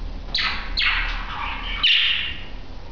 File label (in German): einzelruf wellensittich